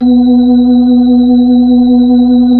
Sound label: B-3, Keyboards of The 60's and 70's, B-3 Brite